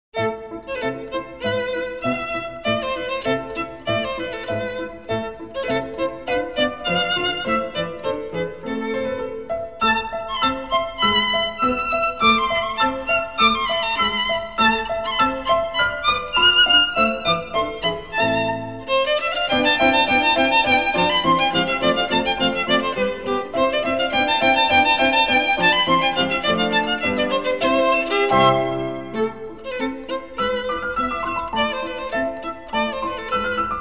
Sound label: Tambourin, violin; Suzuki Violin Method; A.E. Gretry